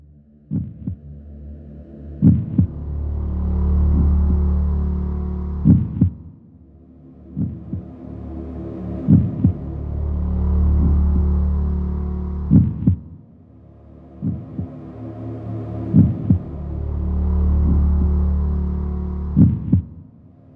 gameover tail

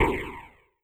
aoe Blast

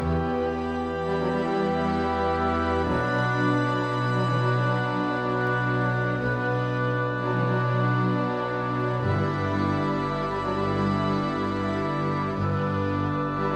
woodwinds and strings

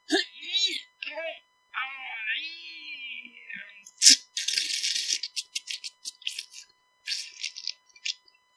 diarea, some crap vocal sound for horor games

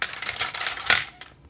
bones sound fx

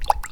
water reentry

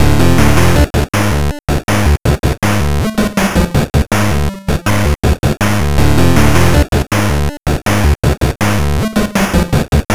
Boss Cutscene 8-bit

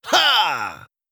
effort grunt male

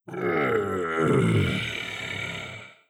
Cow Die

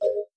life pickup

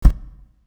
footsteps

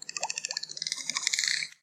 dolphin clicks

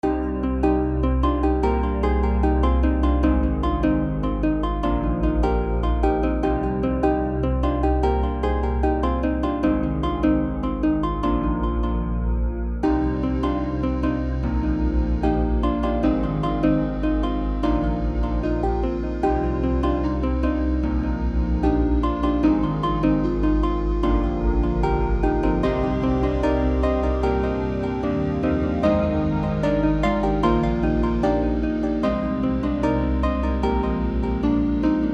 unknown space bassed

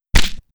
punch

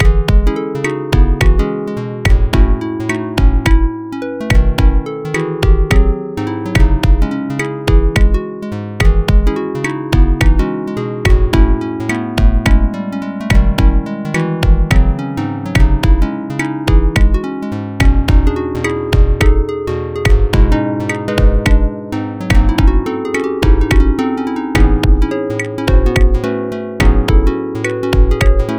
Plucked

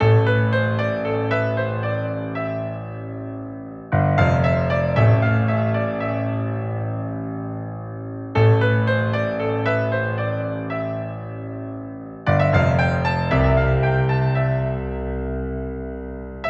Broken Kingdom (Piano Version)

